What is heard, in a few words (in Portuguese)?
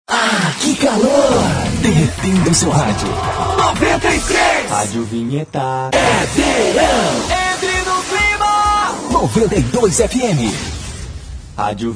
vinhetas verao